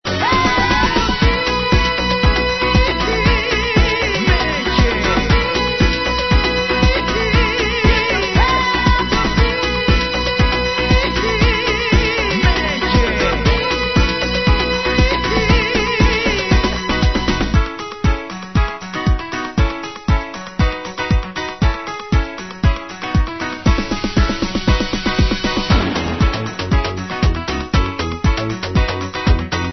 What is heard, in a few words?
deep